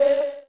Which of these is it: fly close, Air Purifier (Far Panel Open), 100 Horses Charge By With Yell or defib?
fly close